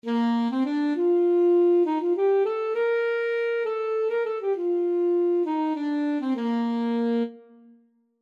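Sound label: Alto Sax octave; Range identification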